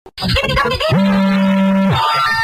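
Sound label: goofy ringtone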